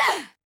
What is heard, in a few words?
Grunt; Lewd SFX